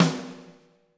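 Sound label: snare drum, Drums; Bob Clearmountain Drums I; SD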